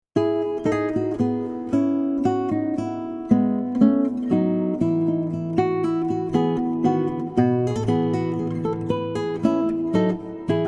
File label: Minuet